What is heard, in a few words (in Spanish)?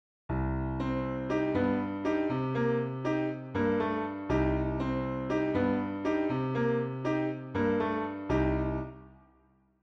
montuno tumbao